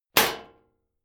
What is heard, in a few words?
club hit sheet clank hard